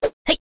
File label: symbol pass; jingle spin